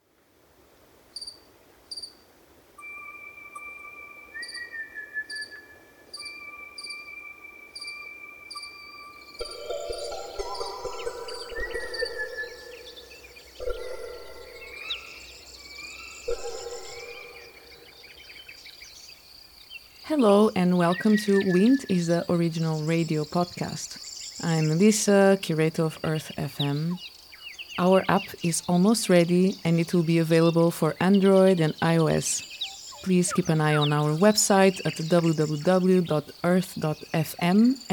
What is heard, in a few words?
Midnight Insect Chorus Near Corcovado; Andy Martin; Wind Is the Original Radio Fridays